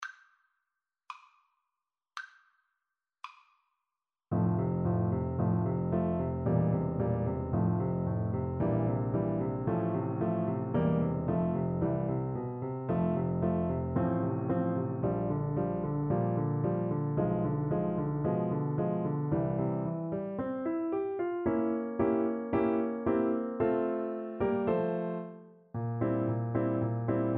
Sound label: la partenza, Trumpet; Beethoven, Ludwig van - La Partenza, WoO 124